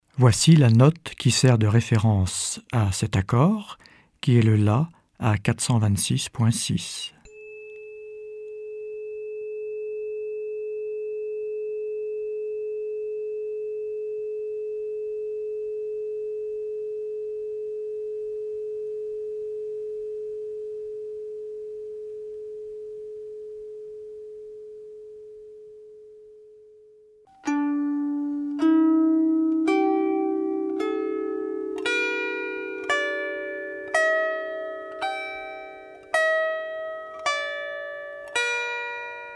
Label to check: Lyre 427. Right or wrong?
Right